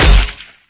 skill explo

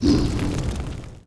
trap death, monster

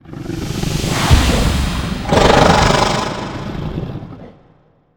seed frog; monster

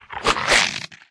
mongoos attack, monster

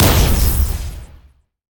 Mech Bull explo; monster

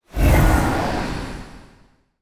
iceguardian, monster

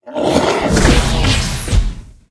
dark knight attack, monster

